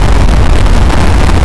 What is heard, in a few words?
Turbo Loop